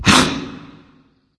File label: nv breath; smite; voicepack; xbalanque